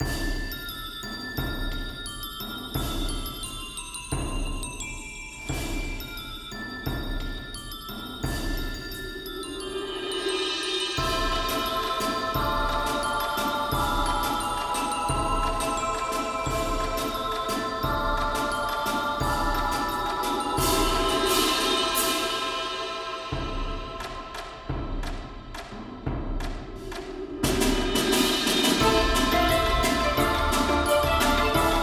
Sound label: Carrousel (Instrumental); friday night funkin vs. needlestick sonic ost mod